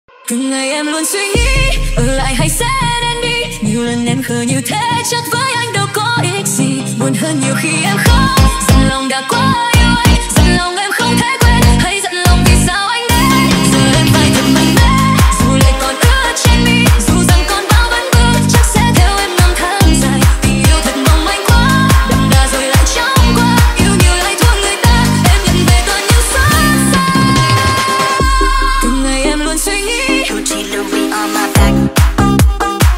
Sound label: remix